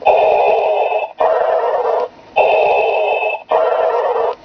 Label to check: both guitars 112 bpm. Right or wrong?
Wrong